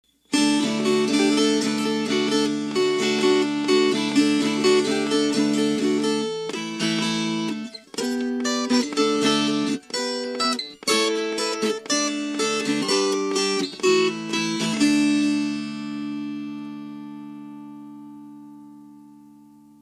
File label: Over In The Meadow Swing